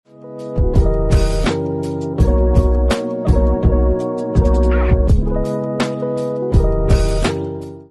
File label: cool chill lofi